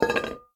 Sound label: drop metal hollow; Rust